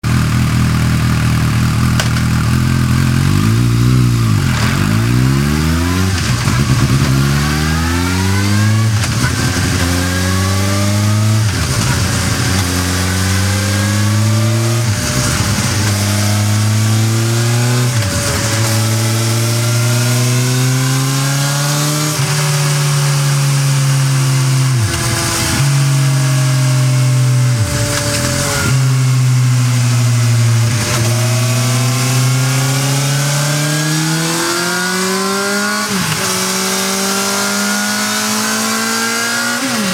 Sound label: SUZUKI GSR 750 GP, exhausts; 2011